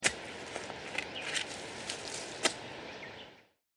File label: chick farm